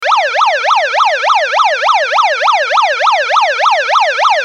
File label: siren